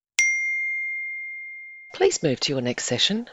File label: single chime please move to your next session